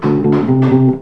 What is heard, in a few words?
stone